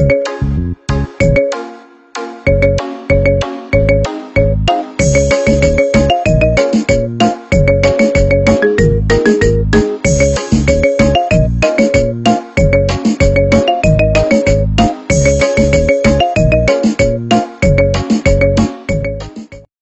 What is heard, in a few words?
Marimba